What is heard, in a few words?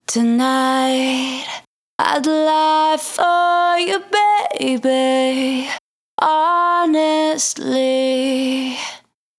vocal hook lie for you dry 128 Abmaj